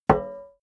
plate hit ring damped

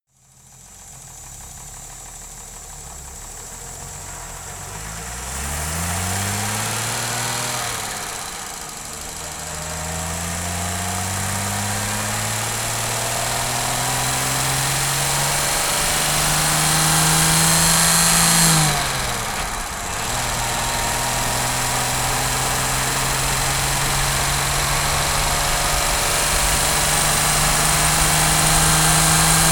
car jaguar e-type drive steady engine

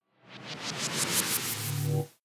sci-fi boot incoming; swooshes-swishes

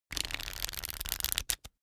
pack of cards shuffle table

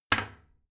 toilet seat slam soft; haus-garten; badezimmer

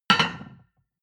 plate on plate place medium, restaurant cafe

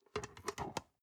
storage lid slide close soft, box; objekte

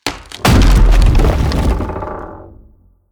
hit wood, hits impacts

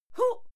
female fight scream huh short

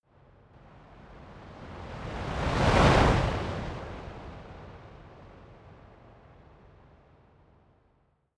fire torch bright slow long flutter whoosh; swooshes-swishes

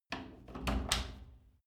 wood door old lock

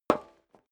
step dress boots metal dry single right; schritte; Footsteps; menschen

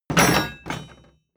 wood impact rattle

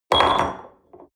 espresso upset table medium, restaurant cafe

